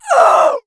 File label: Female Death